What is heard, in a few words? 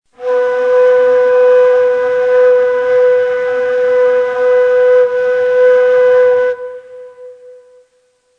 Dugah, Neysample